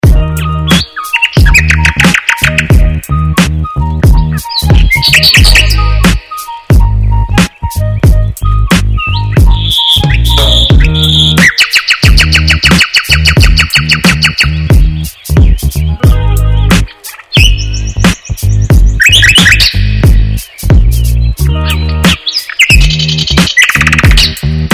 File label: nightingale